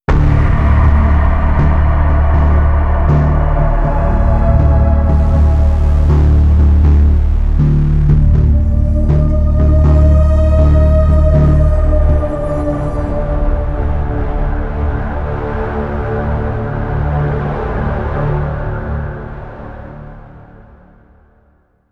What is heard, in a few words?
Side Stinger; Origins